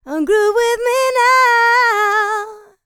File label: LICKS, DANCE; ILIO; Vocal Planet